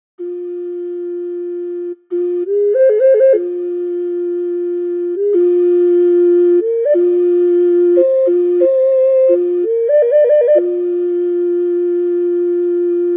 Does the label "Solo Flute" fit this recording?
Yes